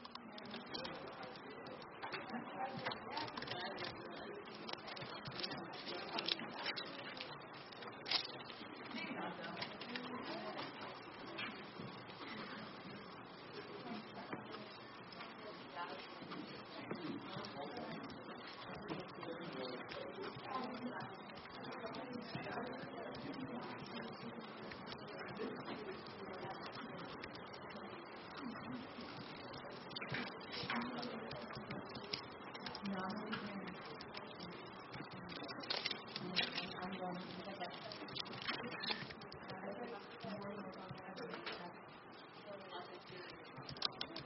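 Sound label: Ambience Work Office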